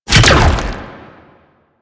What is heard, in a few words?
Hand Bomber Proj Fire; Warframe; Man In The Wall